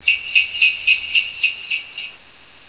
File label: jingle; holiday